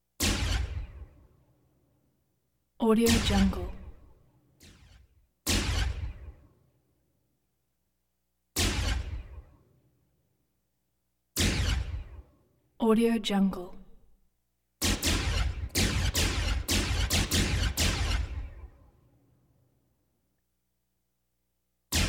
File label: scifi weapon